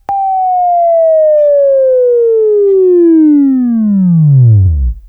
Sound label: Buzz, Left